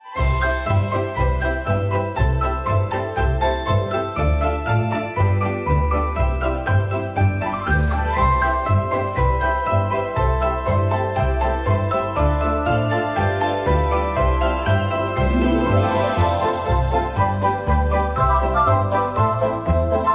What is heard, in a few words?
ringing of bells